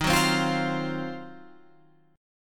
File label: D sharp Minor 6th Add 9th D sharp Guitar Open F, chord; strummed